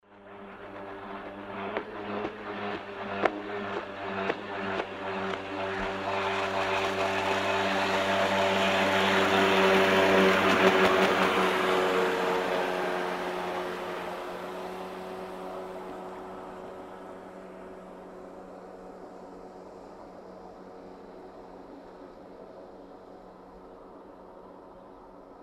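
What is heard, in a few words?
jet ski sound passes by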